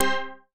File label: Light Time; Game rip; MiSide